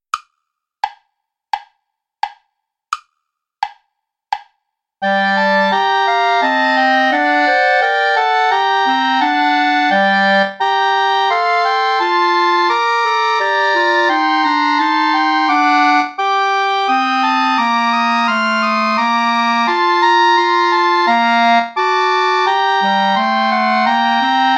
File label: play along without clarinet